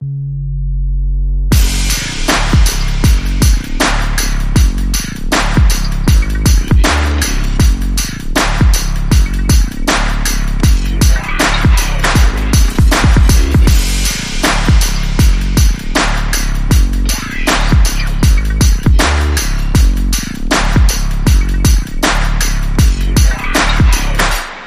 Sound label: Groove And Bass